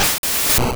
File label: Quick Attack; sfx; attack moves; pokemon; gen 2; gsc